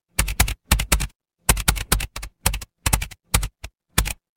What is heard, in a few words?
office keyboard